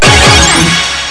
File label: jingle